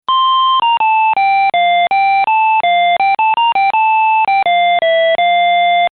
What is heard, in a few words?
palm mono